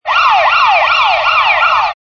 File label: Siren; Danger; Hiptop-3; Chimes